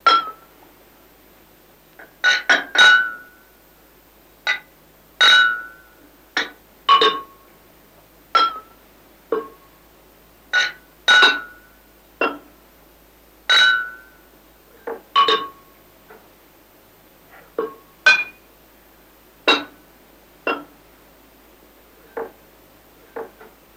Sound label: Bar Glass Clinks